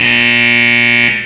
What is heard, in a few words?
Buzzer; Intel; Createshare; PC Camera Games; Shoop